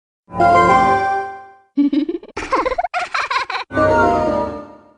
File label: midna laughing; Legend of Zelda: Twilight Princess